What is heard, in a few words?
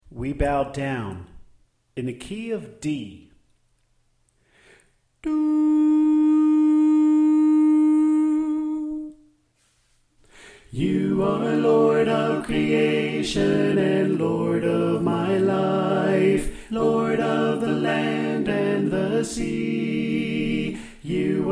Tenor Left